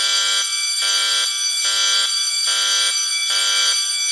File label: buzzer trevoga; russian sounds